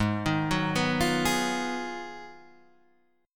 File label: Ab Minor 13th, chord; Abm13 arpeggiated; JGuitar